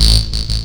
saw lead synth

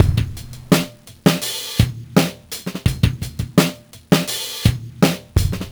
84 bpm, breaks; Zero-G Beneath the Planet of the Breaks

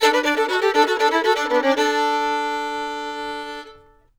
FIDDLE; Sampleheads; Whole Lotta Country 1 & 2

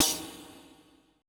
E TAMB, ETH PERC

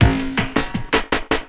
LOOP, 160 BPM, Drum & Bass

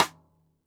xstick snares on; JazzFunkKit

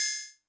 vermona drm1 mk3 hh

